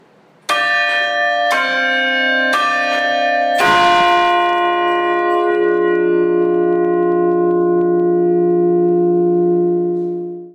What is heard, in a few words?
Madonna Quarter